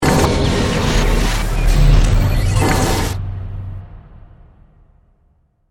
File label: Hit Digital; Hit Sound Effects